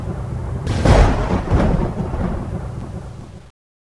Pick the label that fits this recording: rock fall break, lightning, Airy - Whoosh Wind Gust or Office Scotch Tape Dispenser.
lightning